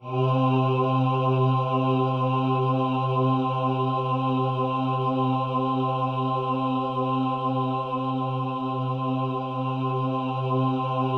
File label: C3, Synth Leads; 90 s Sample CDs; NiceBeats (NiceBytes); Studioline 09